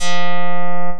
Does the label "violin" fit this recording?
Yes